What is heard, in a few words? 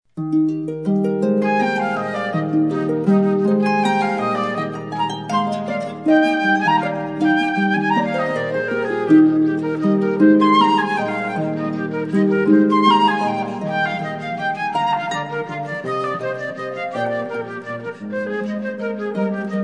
Duo, harp; classical; Bach: Eb Sonata